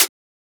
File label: Closed Hat